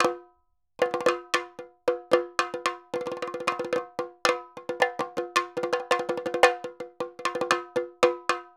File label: 112 djembe; shiva